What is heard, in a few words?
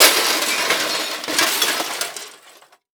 Metal Plastic And Glass Debris Smashing On Pavement; Crashes